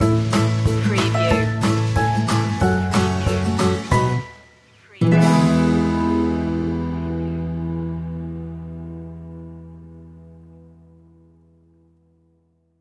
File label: sassafras stinger